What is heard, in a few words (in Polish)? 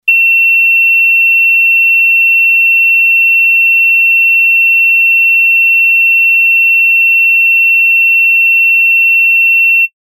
ton